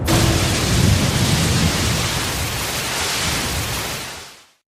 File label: Ship Door Open Pressurize; Lethal Company